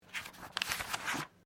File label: Book Page Turn; Book movement; Books (Sound Effects:Offices)